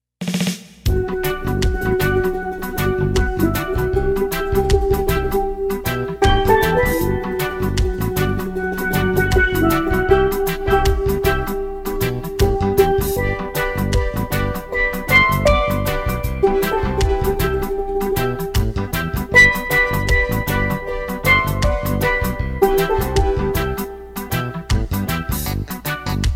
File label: Swing